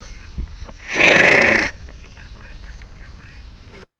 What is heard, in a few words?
guanaco making short fluttering snorts; animals